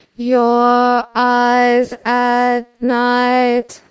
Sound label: singing